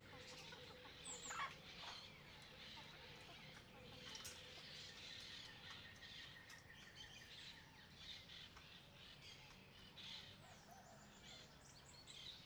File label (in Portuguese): Ambiencia chacara de manha galinhas andando pela grama, araras, passaros; cachorros; ambiencia rural